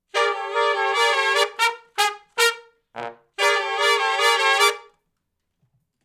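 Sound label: R&B Riff (Bb) harmony to unison; Sections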